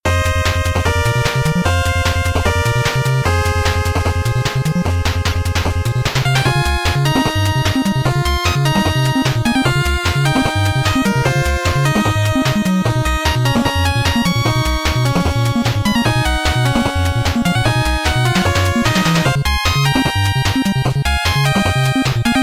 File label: BGM 8bit; Mighty No. 9; 8-bit soundtrack